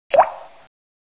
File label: water drop, ambience